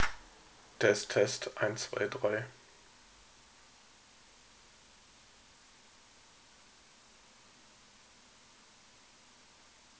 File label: sound noisy; sox